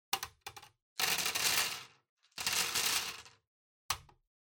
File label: Bingo Machine Ball Put In